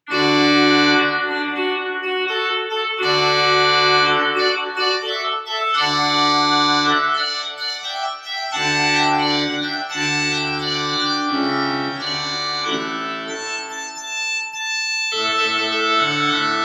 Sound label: Marsh Fanfare